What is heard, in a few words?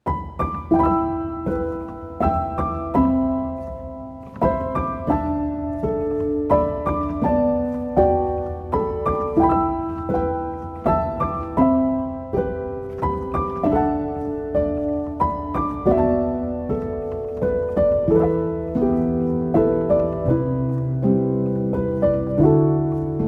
Piano; The Secret World Of Arrietty